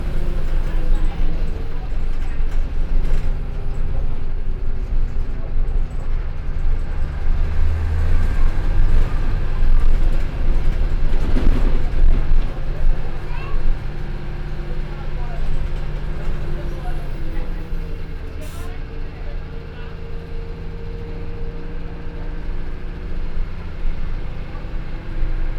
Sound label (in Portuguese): No Onibus, Fieldrecordings Brasilien